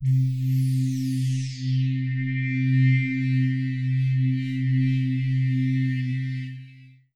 barf, Synths; Notes

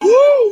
"woo", voice

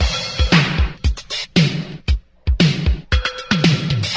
Drum loops, Electro

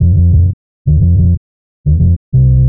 bass, Loops

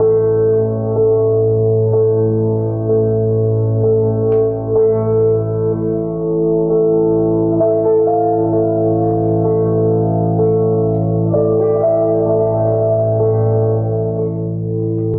speed up, electronic loops

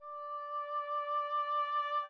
oboe; Winds

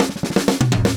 meaty fill, drum loops, rolls

